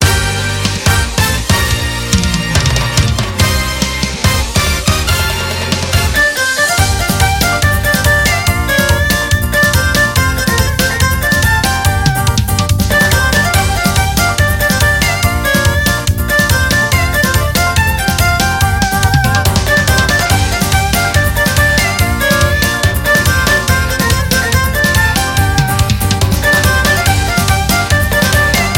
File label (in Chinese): 二胡楊琴